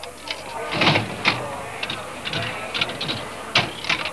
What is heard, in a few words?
Casino, slot machine sounds